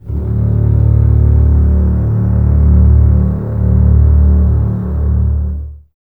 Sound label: Basses D 1, String Ensembles; Miroslav Vitous; 90 s Sample CDs